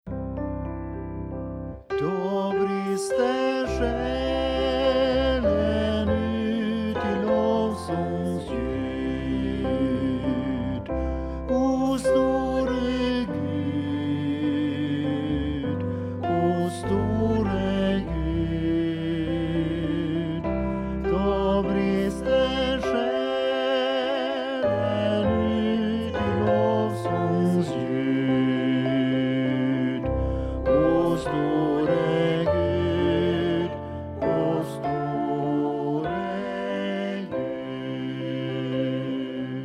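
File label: SATB bass; O store Gud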